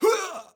Male Death Cry

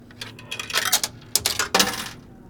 Quarter Slot

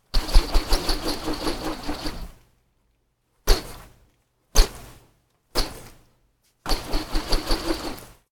Rotating Swooshes Phased

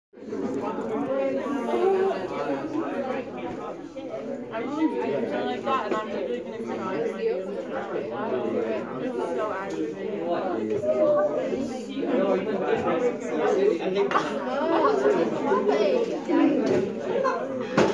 School Classroom Background

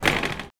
Basketball Backboard

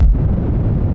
Abstract Kick

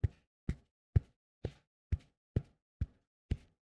Generic Footsteps

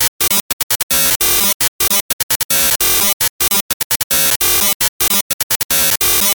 Noise Box

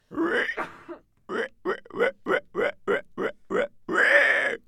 Pig

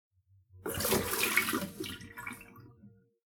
Water Spill

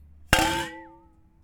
Bottle

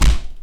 Punch Hard

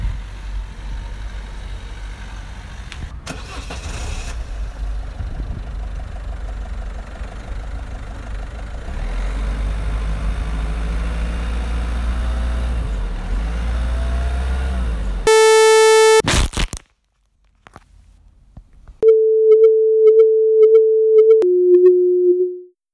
Car Accident

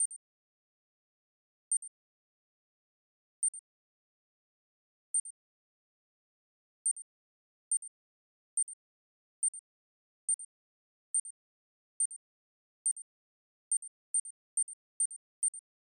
Beep Bomb Countdown

